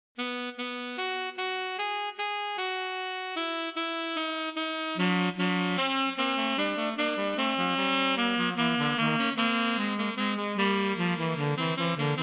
Twinkle canon 72 edo

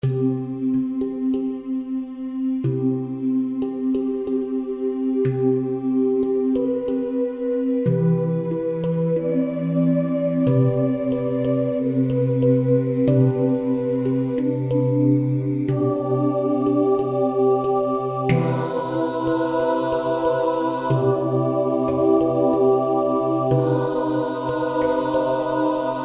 harmonic series

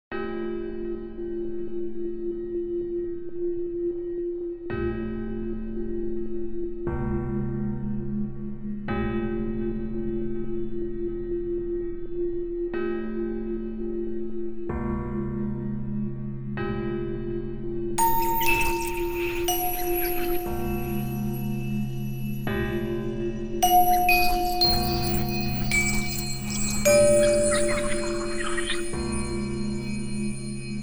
bells; just; otone

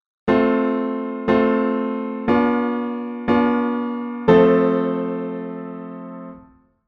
plagal cadence; 16 edo theory; 16-ET

